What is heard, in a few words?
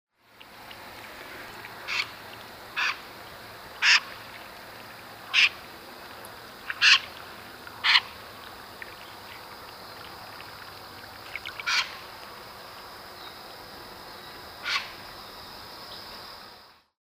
Wilsons Snipe, Gallinago delicata; shorebirds rails